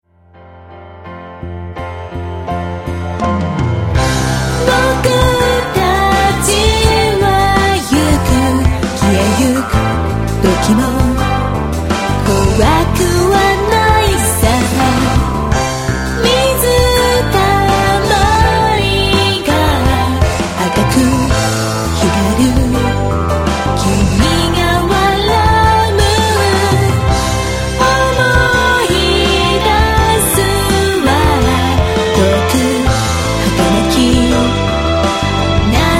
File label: vocal